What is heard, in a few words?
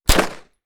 9mm single shot